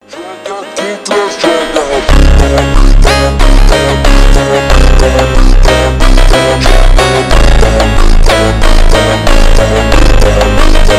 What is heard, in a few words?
funk abnormal ultra slowed